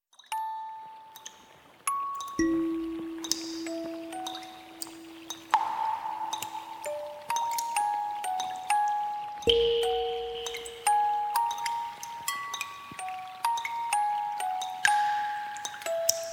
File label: Quiet; ZTE; Axon-20-5G; alarms